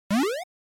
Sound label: Action Jump